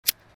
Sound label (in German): feuerzeug